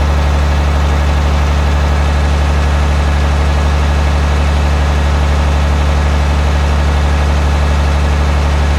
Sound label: 500 Rpm Volvo